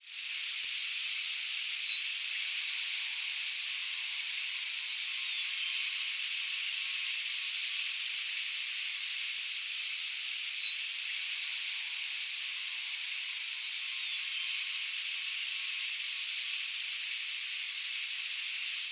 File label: bairds beaked whales